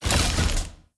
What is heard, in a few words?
Occupy Upgrade; Conquest; blue archive